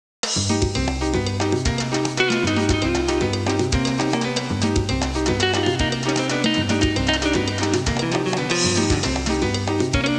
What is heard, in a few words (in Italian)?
musica latina